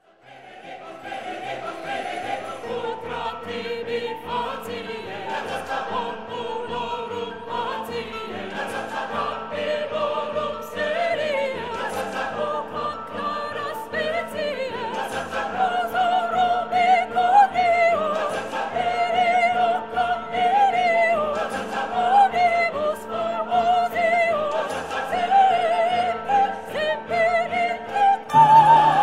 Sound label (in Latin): Veni veni venias Coro 2 Soprano up to tempo